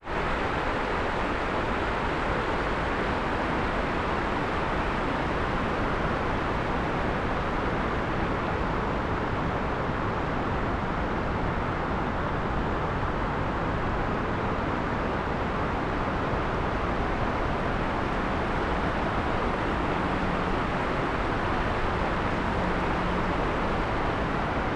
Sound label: kayak; Dight's Falls; Dight's Falls Tour 2026